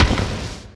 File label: asteroid damage carbonic medium; Factorio